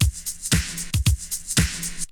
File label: Lo-Fi, Essential beats; FM 57 - The Ultimate Sample Collection (1997)